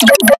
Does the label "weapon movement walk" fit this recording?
No